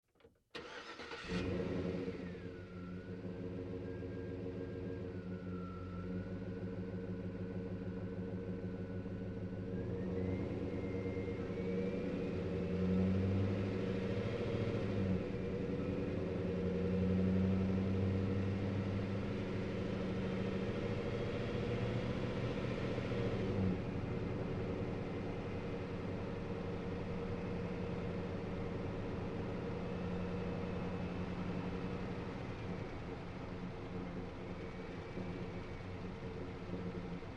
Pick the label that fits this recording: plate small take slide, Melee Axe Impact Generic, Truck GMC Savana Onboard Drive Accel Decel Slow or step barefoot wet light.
Truck GMC Savana Onboard Drive Accel Decel Slow